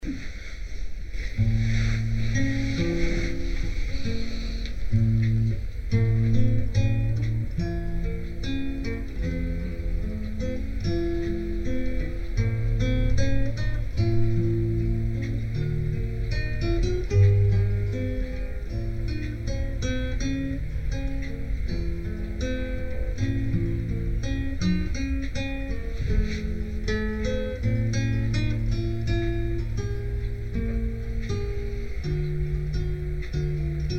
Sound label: instrumental